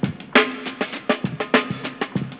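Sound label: FUNKY DRUM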